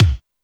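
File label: kick Stab, Alesis D4 drum machine; 300 Drum Machines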